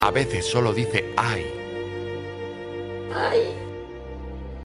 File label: ay click